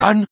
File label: medic taunt exert; rps; video games; source engine; tf; taunts